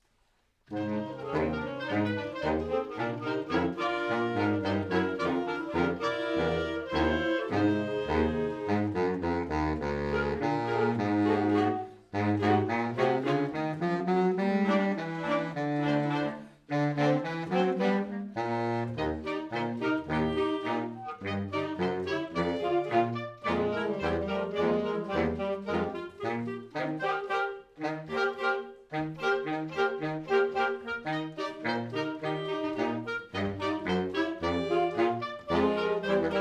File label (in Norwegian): Klarinett sax